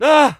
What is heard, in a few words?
fear